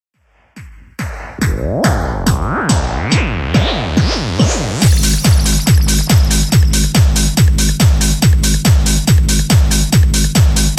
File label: 90s Xtend 141bpm; Xtend Edit